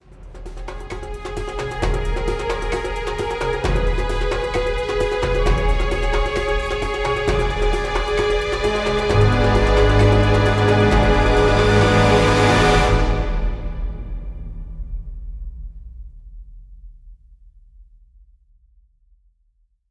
Combat end tag; Gregs Multilayer

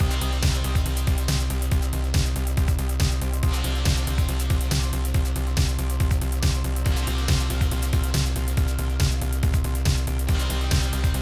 music Fight; Dreamball

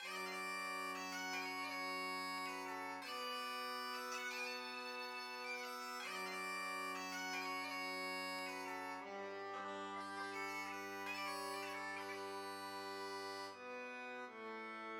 Bagpipe

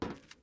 metal step; teardown ps5 windows xbox series xs gamerip 2022

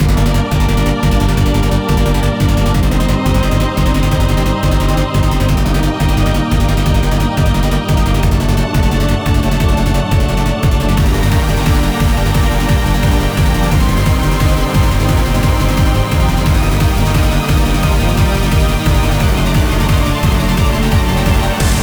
Boss Full; project aether first contact

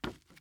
wood step; teardown ps5 windows xbox series xs gamerip 2022

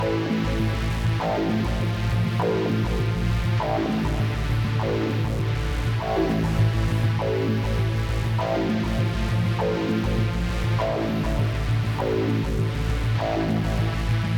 Synth Come In Loop; Void Bastards

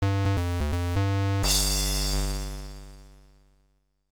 Level Clear; Bombaman Extra Ammo; MSX2

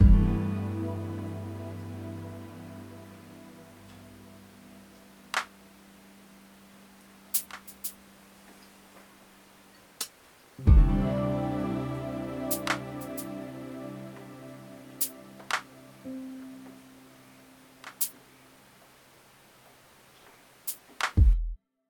Loop; Mixolumia

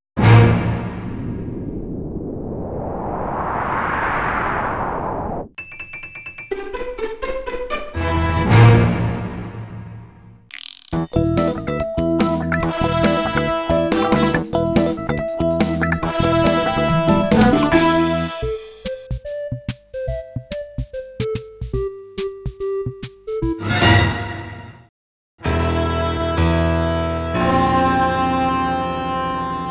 Disco Volcano Outro; Taz Wanted soundtrack

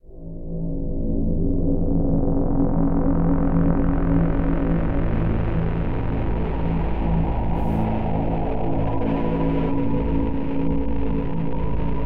Ambient Tension; Music Briefings

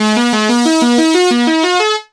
Beat

